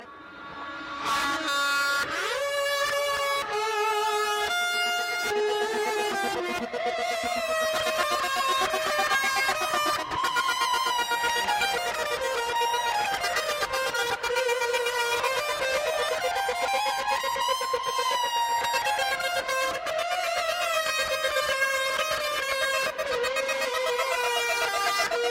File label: reversed guitar